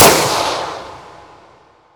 plasma burst

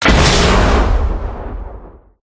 rocket launch stereo; silverback

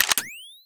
turret awaken

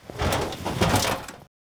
fatman equip; weapons

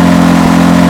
low, vehicles; dukes; gta5

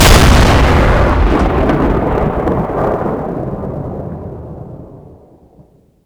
t90ms cannon; weapons

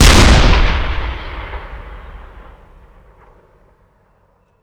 tank fire

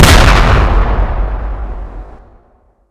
big splode close

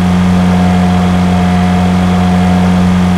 simulated vehicles mid

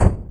flesh step, doom3 pinky

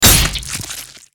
damage; blackknight

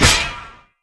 sword pullout

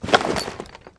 gasmask on

